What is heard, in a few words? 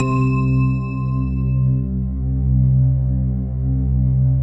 PAD, DIGI-PADS